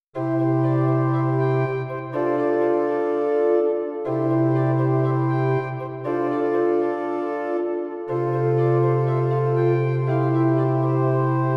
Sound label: Nord C2D Pipe